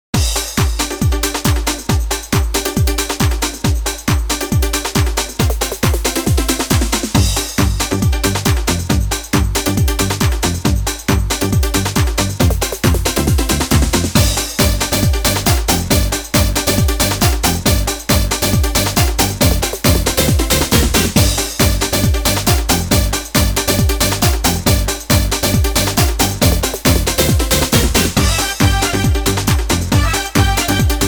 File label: groove time 137; dmu sync music showcase